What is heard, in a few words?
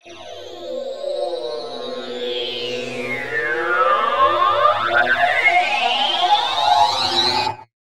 SQUEEZER, Trance; ILIO; Trance Fusion; STOP LONG